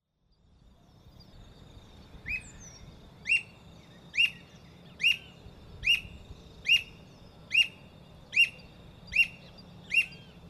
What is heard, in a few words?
Alma de gato Piaya cayana; Jacauna